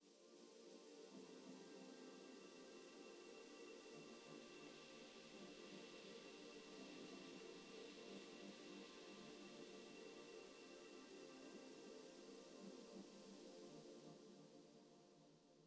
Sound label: closing granule gm